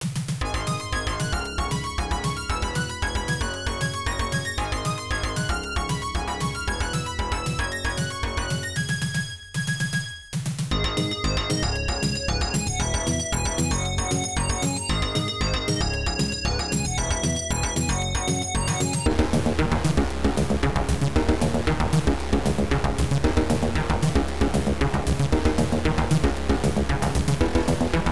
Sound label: Bonus Level BGM; Cybersphere; MS-DOS